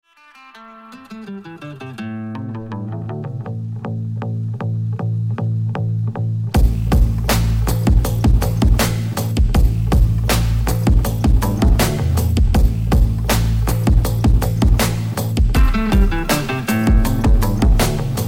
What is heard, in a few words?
instrumental